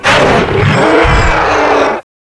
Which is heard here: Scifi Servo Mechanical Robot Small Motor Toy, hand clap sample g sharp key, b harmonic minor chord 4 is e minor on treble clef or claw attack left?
claw attack left